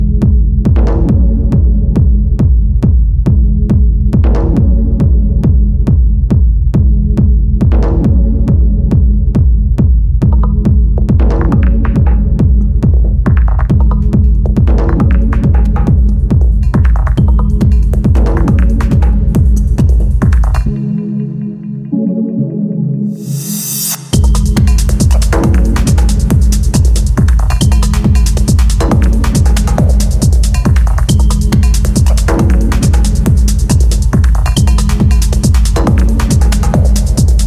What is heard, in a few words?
Techno